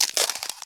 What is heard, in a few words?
ice crack; Scrat